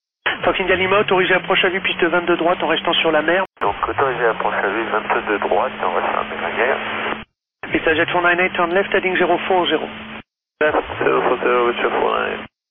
avion

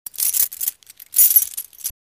clef fail

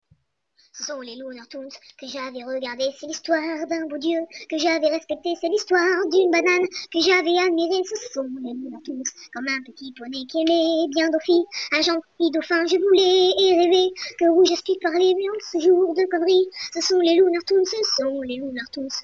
generique

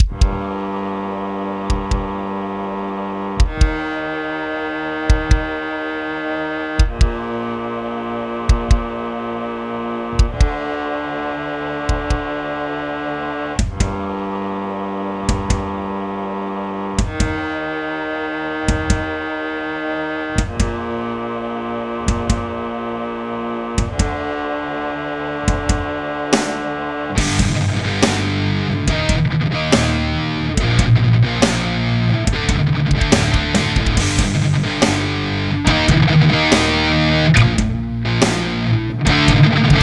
Test guitare; attention deboite

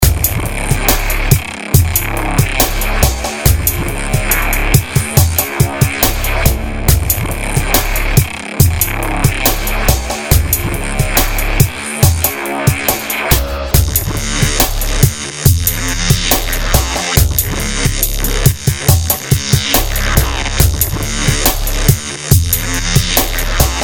Instru

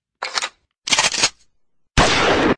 Son Arme feu; cyprien ou cyprigun

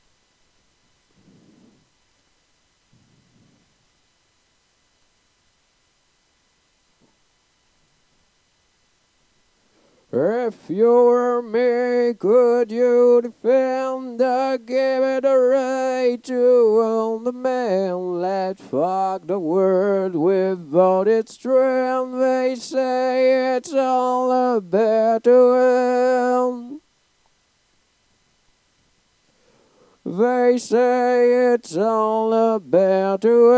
refrain chant

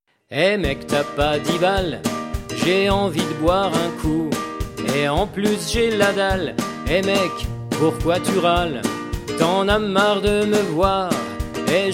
batterie

